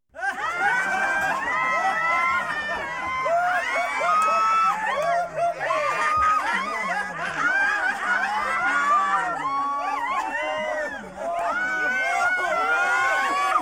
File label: the laughing crying screaming masses